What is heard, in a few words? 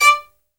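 violin staccato D 4; AKAI S-Series CD-ROM Sound Library VOL-7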